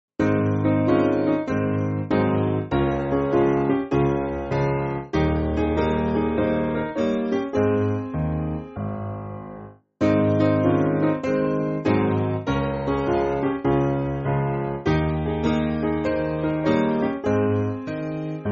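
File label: Piano, Music Backing Files for Churches; Ring The Bells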